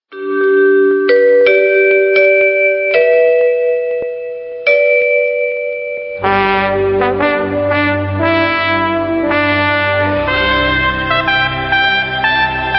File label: interval signal; 中国国际广播电台英语广播开始曲; cri